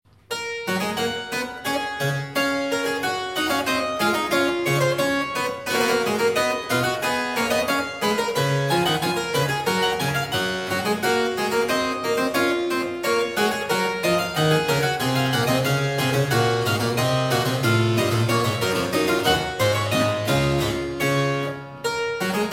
Goldberg Variations, Variatio 18 Canone all Sesta. a 1 Clav; J.S.Bach; BWV 988